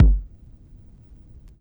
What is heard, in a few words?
Vinyl Kick, drum